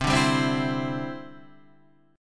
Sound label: E Minor 7th Sharp 5th C